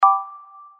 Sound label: triad gbd